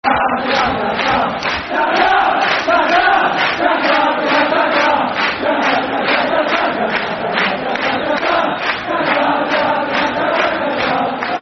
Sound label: Kaka, Football Chants (Soccer Songs); Rangers GRFC